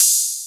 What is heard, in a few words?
ride sample G key, drum ride tuned to the G note; cymbal drum